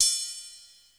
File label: RIDE, DRUMS; Crypton - DrumBase5000